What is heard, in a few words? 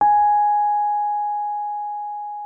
Rhodes MK1 G#5; Xsample VOL-1